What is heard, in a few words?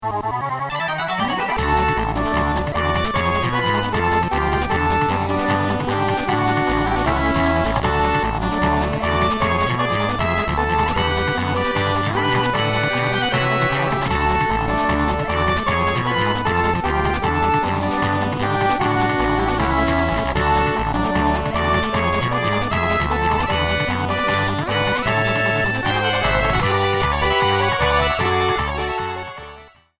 Mini-Game Theme; MPA